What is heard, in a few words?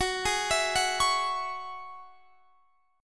F sharp 7th Suspended 2nd F sharp down Guitar Standard; F#7sus2 arpeggiated